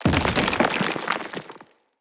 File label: Tempest Tesla Cannon Impact Surface